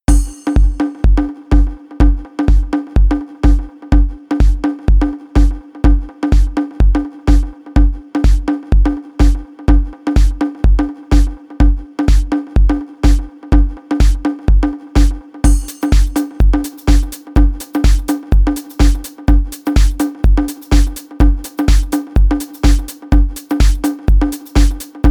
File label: Deep House Mix